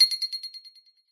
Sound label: complete; sokoban warehouse